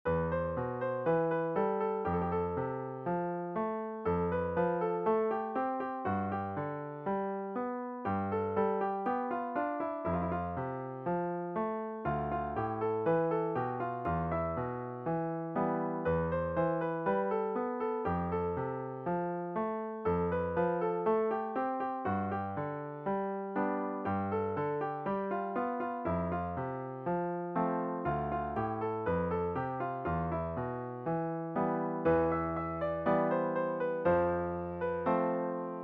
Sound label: piano